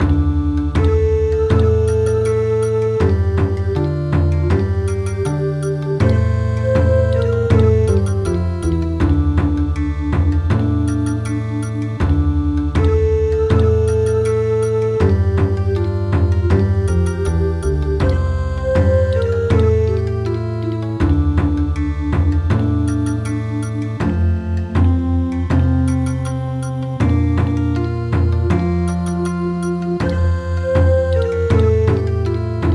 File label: Bon Odori; All the Pretty Little Horses